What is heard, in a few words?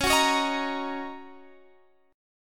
Gb 6th Db